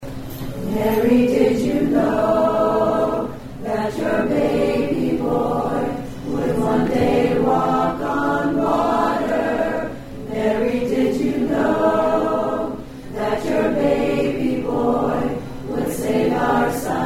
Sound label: choir; ozarks healthcare foundation lights of life